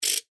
Ratchet Single; Hand Tools; Sound Effects:Tools

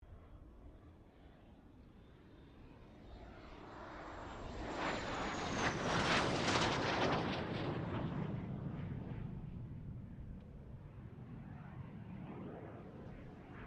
AIRCRAFT JET PROVOST T2 PASS; BAC Jet Provost; Airplanes; Vehicles

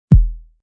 Bass Drum Single; Bass Button Sound Effects

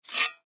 Tin Small Lid Up, Tin Lid Pick Up Scrape; Foley Tin Container Small Open Lid; Lids (Sound Effects:Objects); Blastwave

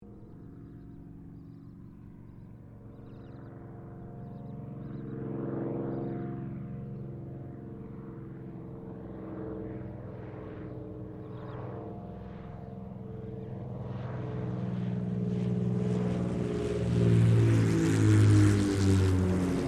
AIRCRAFT SUPERMARINE SPITFIRE MK-XVI LAND; Airplanes (Sound Effects:Vehicles)

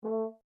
HORN A4 P SHORT, French Horn in F; Alexander French Horn in F; Musical

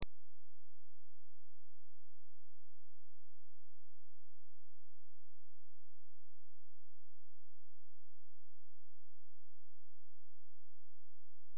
Test Tone Square; Wave 16Khz 60 Secs 0Db Loop; Blastwave

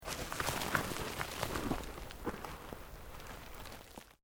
EARTH LOOSE MOVE LONG, Debris

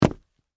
Boots Jump, Concrete; Footsteps; Human; BlastWave

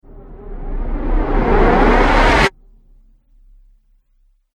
Riser Abrupt Stop Flanger; Rise And Dive

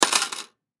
Coin Quarters Drop; Drawer; Foley; Money; Objects; Blastwave

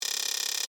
Data Hard Drive Beeps, Readout, Scifi; Tech

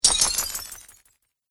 Magic Chain Impact

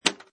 Pinball Sound Flipper; Video Games; Lynne Music

